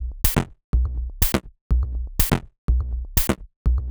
Top 123bpm, House Drumloops; Mint; Zero-G House Fabrik Drumloops

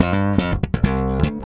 REAL BASS, BASSLICKS; Ueberschall; Jam Box

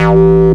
hardcore, BASS, Techno Trance

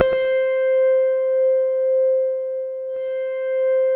C5, SynthLine; 90 s Sample CDs; NiceBeats; SynthLine VOL-33

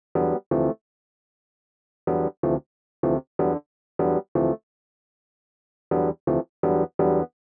125 Rhodes E, Keys, Nu Jazz House; PowerFX - Nu Jazz House

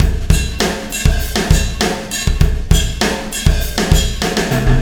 Wormhole Beat, Processed Drumkits

